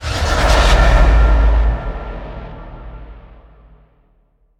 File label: End Stinger; Void Bastards; Otori